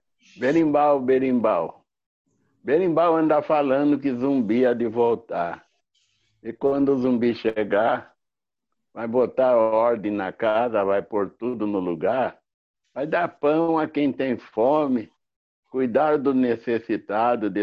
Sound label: Berimbau; Carlos de Assumpção